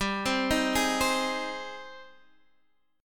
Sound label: Ab Major, G, guitar chord; arpeggiated; Ab/G; JGuitar